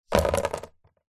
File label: nature water drips sudden on card folder binder